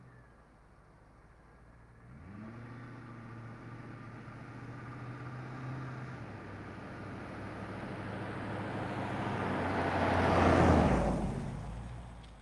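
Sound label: ford ltd exterior medium passbys forward stop reverse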